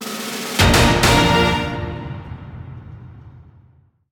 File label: Player Level Up; Inferno Climber